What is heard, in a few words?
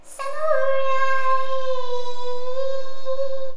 superpitched, female singing; VOCALS and SPOKEN WORD